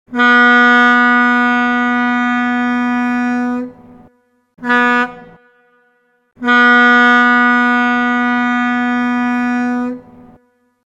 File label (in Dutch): lang-kort-lang